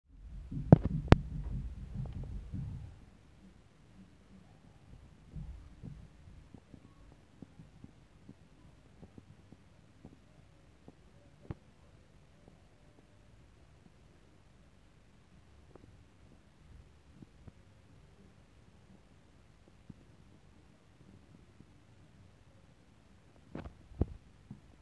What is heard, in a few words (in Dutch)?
stampen en natuurlijk muziek overlast